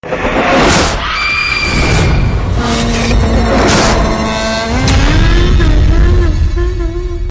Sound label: scary ringtone; tamil; kanchana 3